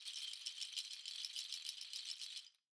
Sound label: rattles Roll, Raw Perc One Shots; Slate; SD SEISMIC Drum Sample Pack